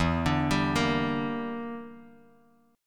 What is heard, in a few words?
E Minor 7th Flat 5th E, Guitar DADGAD